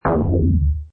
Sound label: Shuttle, Button sound or menu sound; Multimedia